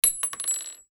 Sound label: cart